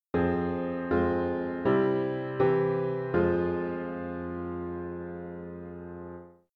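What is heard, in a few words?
piano, dictation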